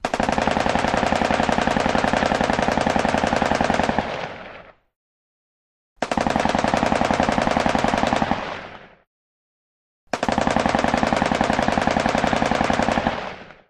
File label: M60 Bursts Long Dual